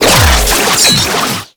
Sentient Vessel Parazon Start; Warframe; Tau

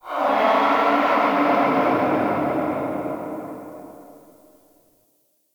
Ghost Horse; Ambience; Dagath; Warframe; Clan Dojo

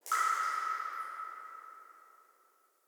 Root Cave Magic Drip; Neathuns

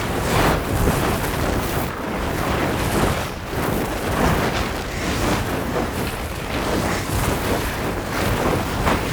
Aura Loop; Warframe; Uriel; Infernalis

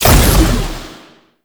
Shot; Warframe; Weapons; Strun; Strun Prime

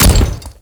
Ghoul Devourer Step Default, Footsteps

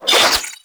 Rising Blades Whoosh; Warframe; Pax Duviricus

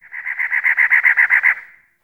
Cactus Wren Bird; Warframe; The New War; Zariman; Apartment; Scene Four

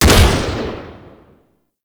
Tiberon Prime Shot B, Rifle; Warframe; Tenno; Tenno Drake Rifle

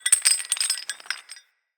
Swing Chain Move

